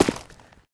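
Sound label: player dirt, footsteps; csgom; cstrike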